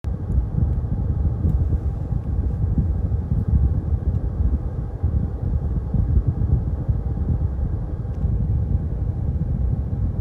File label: Heater